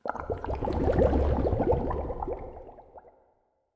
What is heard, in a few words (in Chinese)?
气泡柱漩涡环境声; ambient